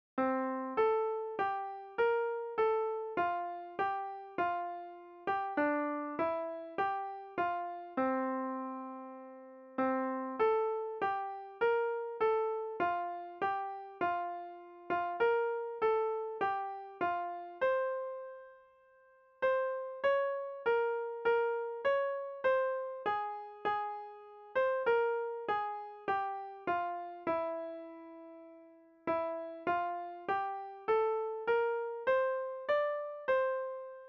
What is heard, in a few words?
hymn melody